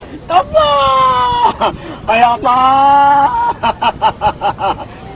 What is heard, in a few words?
wail